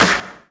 clap; CLAP-HIP; Best Service; Dance Mega Drums

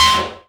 noise, FX & ATMO, ALARMS & ALERTS

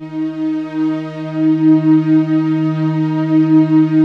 PROPHET VS, Vintage Keyboards

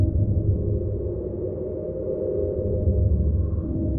Sample n Hold Verb Loops, sparse soundscape

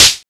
closed-hihats, techno; Techno ID; single-drums

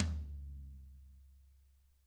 TAM 14 HIT Double Platinum Drums; ILIO; Double Platinum Drums 2; TAMBKIT

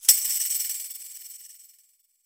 Tambourine Ending; Percussion

